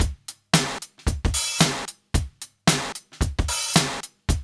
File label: DRUMS, Funky Ass Loops; East West